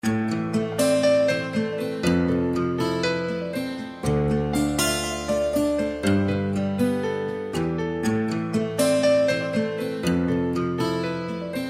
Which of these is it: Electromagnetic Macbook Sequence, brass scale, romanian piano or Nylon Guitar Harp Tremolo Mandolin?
romanian piano